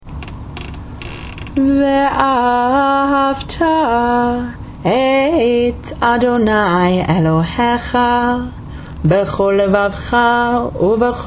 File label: vahavta chanted